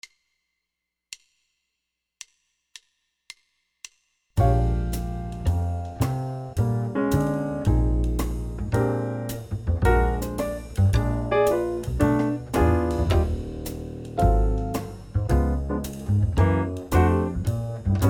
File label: Solo Backing